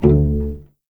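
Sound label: D1 pizzicato cello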